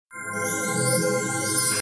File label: hatch egg